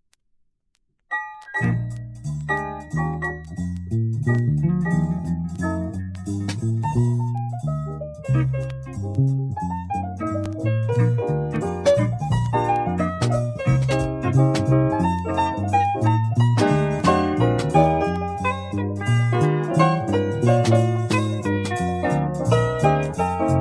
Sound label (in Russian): kvartet, improvizatsiya